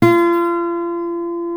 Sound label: F#4 MARTIN 6, Guitars; Spectrasonics; Hans Zimmer Guitars VOL-1